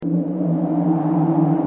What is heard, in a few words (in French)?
Vent